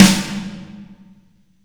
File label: low tone snare f sharp key